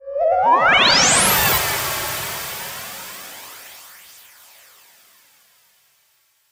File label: Lazers